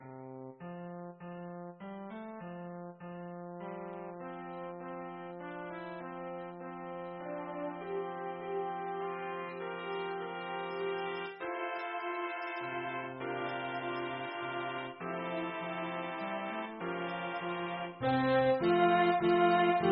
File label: Away in a Manger Soprano